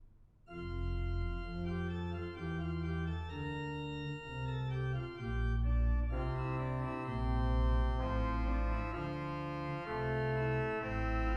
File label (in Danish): orgelkoral; en saedemand